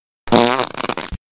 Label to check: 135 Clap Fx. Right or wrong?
Wrong